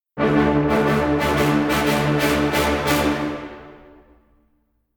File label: Combat Out; The Witcher 3 Wild Hunt; Skellige